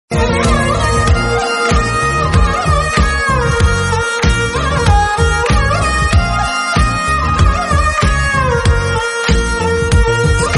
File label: Bgm Tamil Ringtone